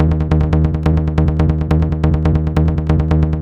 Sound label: Bass; Techno Music Maker